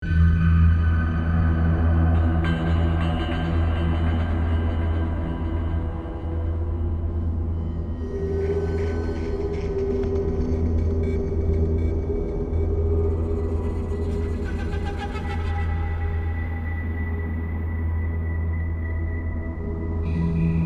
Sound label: Sewer Ambient